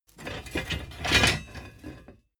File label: plate armor pick up long